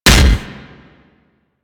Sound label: Impact Metal; Weapons; Impacts; Warframe; Furax